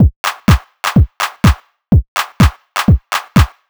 drum loop jacker stripped 125, Classic Chicago House; Sample Magic; Drum Loops